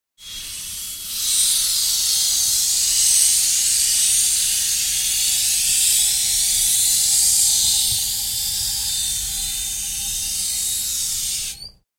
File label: Deflating Small Balloon; Household